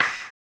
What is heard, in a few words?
HAMBONE; Blues & Soul